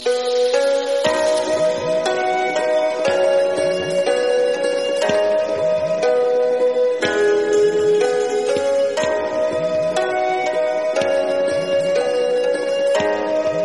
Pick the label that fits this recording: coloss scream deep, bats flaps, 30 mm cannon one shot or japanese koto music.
japanese koto music